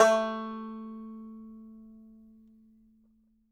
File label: banjo A3, banjo notes; Sampleheads - Whole Lotta Country 1 & 2